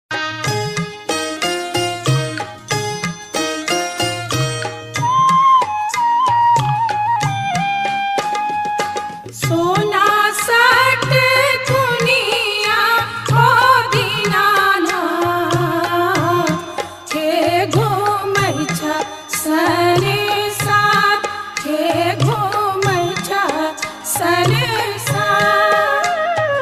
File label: Chhath Geet Song; bhojpuri